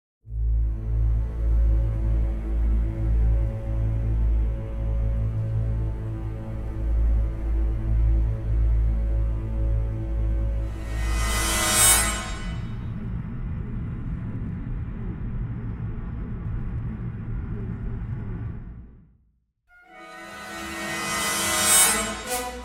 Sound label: Stings Brass; DeathTrap; ProScores